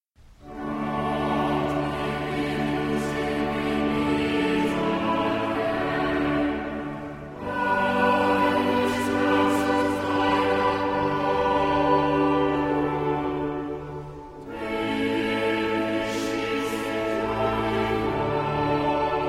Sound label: choir